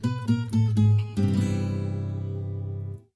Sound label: lose; jocly